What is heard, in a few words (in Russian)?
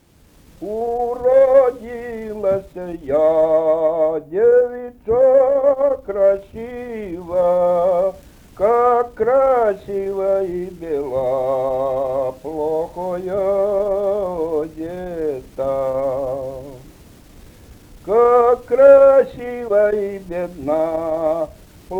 Уродилась я, девица, красива (лирическая)